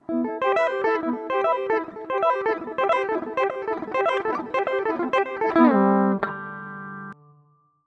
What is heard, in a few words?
A Aeolian guitar